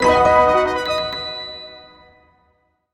Fanfare; Item Obtained!

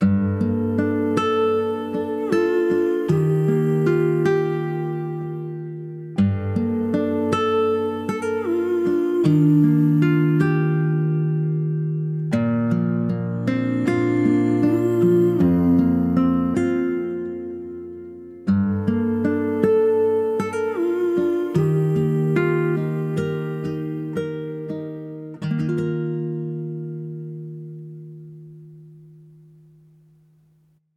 Guitar Song; Haak; Windows

